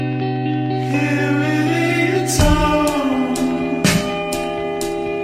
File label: slowed